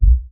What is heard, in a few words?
TV Tube Power Off; SoundsCrate